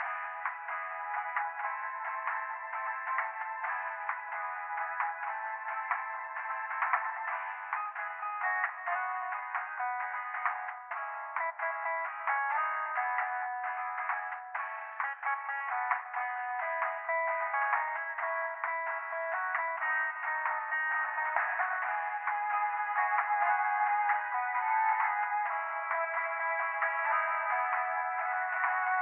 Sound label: town loop